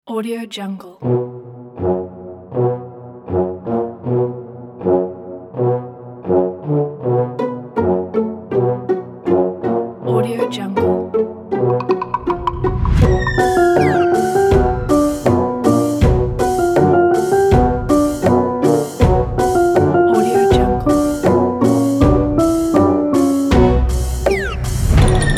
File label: cinematic comedy